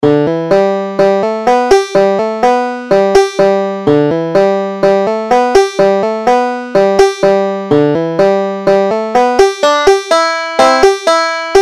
big scioty, banjo

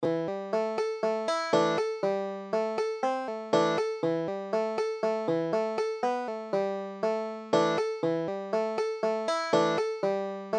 falls of richmond, banjo

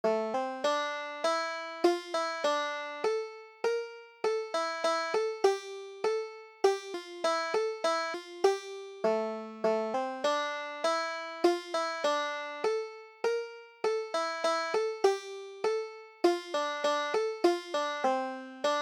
bonnie prince charlie, banjo

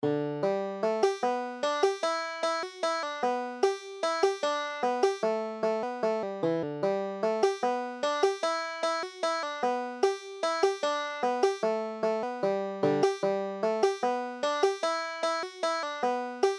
big chief, banjo

